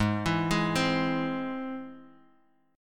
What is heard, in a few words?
Ab Augmented Ab